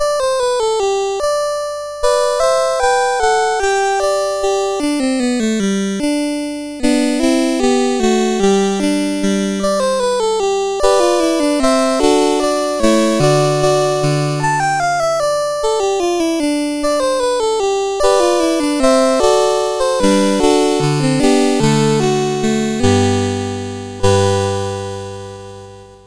Toy, CSG8580R5

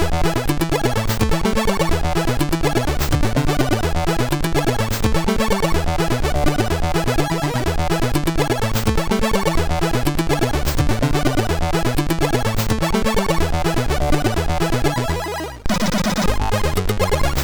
sid MOS6581R2

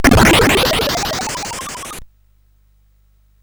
sid CSG8580R5

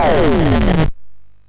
MOS6581R3, sid; Powers of Gloom; hvsc

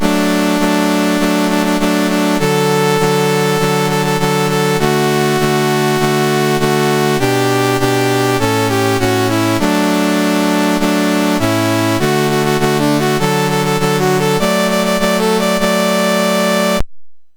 Bahrain National Anthems, MOS6581R4

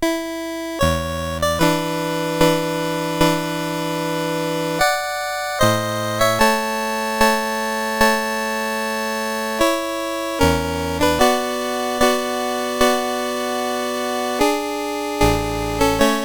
Prelude in A Major, CSG8580R5; Op28 No7

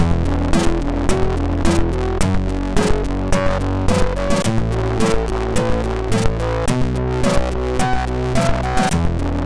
Geister, MOS6581R3

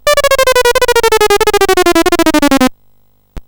BASIC sid CSG8580R5; Auf Wiedersehen Pet; hvsc

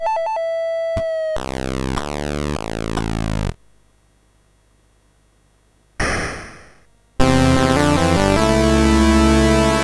Western, MOS 6581; MOS6581R3; Omega Factor

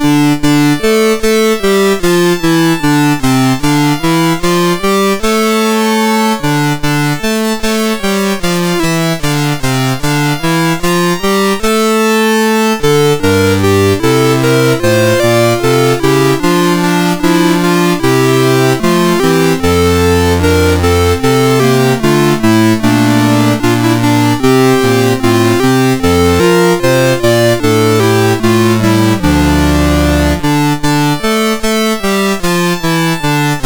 God Rest ye Merry Gentlemen, MOS6581; Bob Huffman

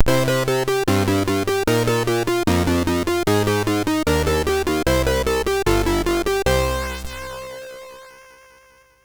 Lights On, MOS6581R3

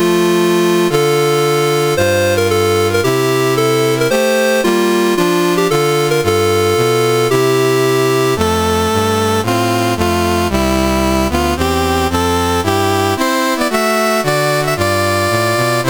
CSG8580R5, National Anthems; Negri Sembilan

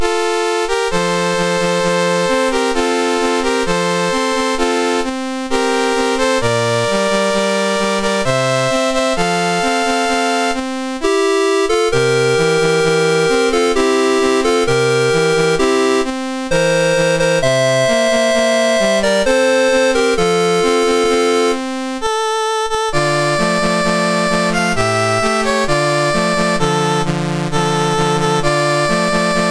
Cyprus, National Anthems, MOS6581R3

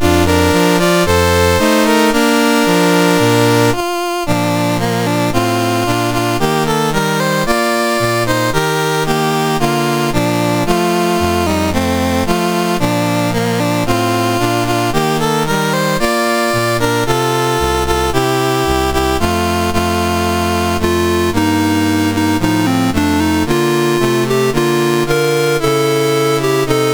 Eire, National Anthems, MOS6581R4

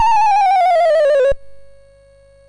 sid, MOS6581R2; Donkey Kong 1983

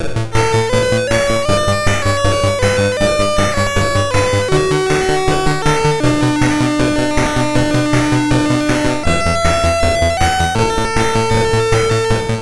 Commodore 64 Music, MOS6581R2

